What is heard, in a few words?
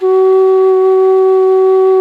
quena F#4; SoundBytes - Early Patches